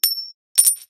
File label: wild coin land; irish pot luck